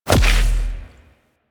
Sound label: Edge Kick; Warframe; Warframes; Pacifist